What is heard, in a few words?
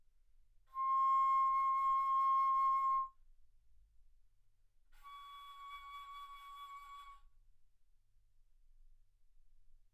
Bass Flute C6 Db6; ff